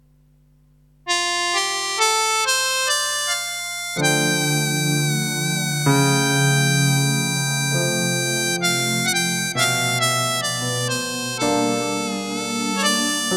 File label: Harmonica